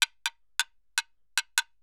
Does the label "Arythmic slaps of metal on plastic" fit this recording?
Yes